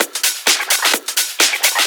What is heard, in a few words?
Discohouse Loop 128 BPM